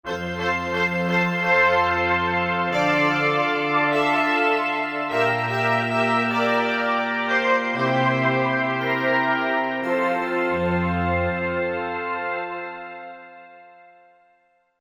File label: Brass Ring, Korg MSC-11S Brass